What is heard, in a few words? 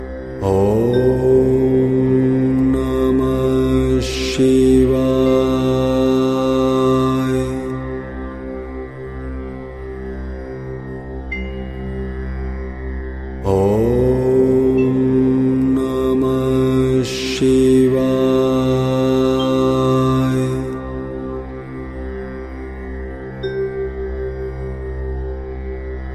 om namah shivaya slow meditation mantra